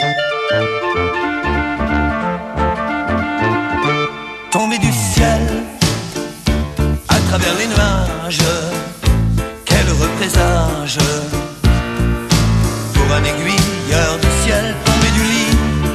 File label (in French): sonnerie